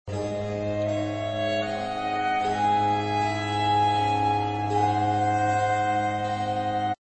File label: american baroque; MagnaLoops